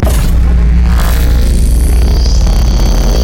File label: Laser Shoot Start; Game rip; MiSide